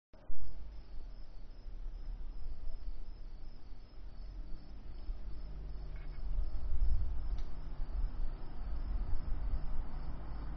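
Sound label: Ambience Street Night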